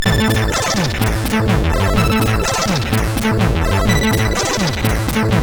X-Music Remix, CSG8580

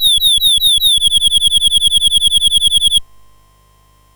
Bird, MOS6581R4

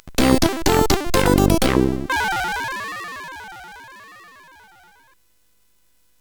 Score, CSG8580

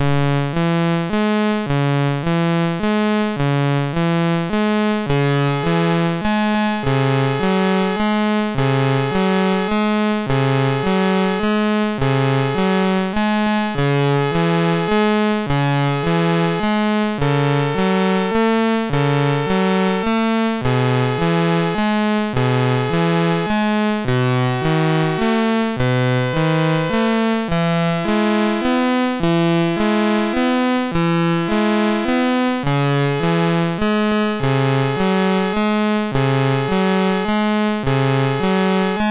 Digi Hits, MOS6581R3

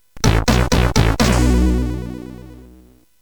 Two Jingles, CSG8580; hvsc

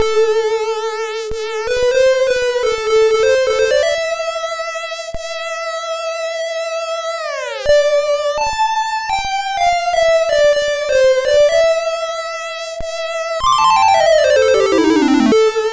Echo, MOS6581R3

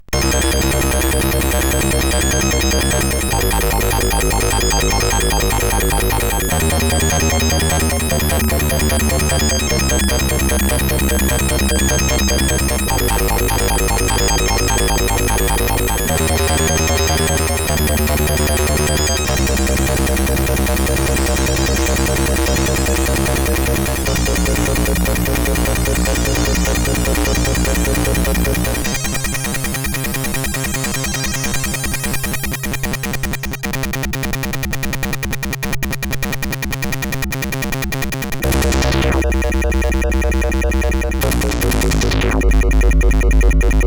Intro Tune, CSG8580; CSG8580R5; Hotline

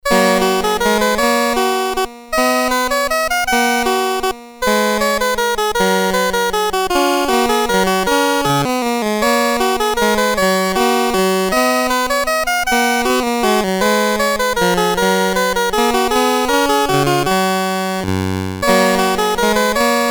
Minuet in G BASIC, Commodore CSG8580R5

